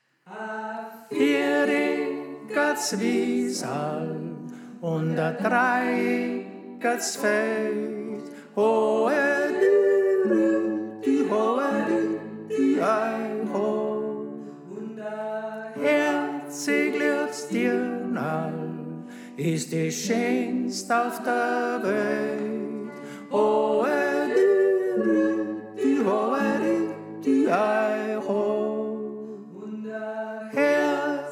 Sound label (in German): Tenor